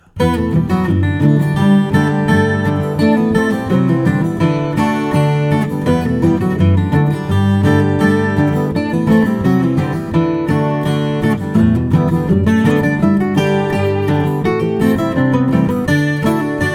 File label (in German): Country Folk alles zusammen